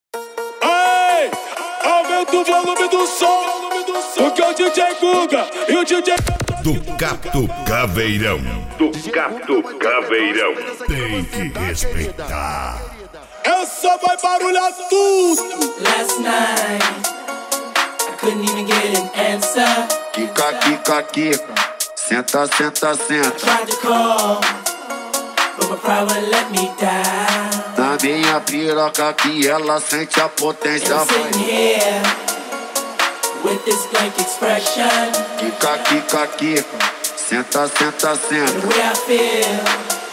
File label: especial mega funk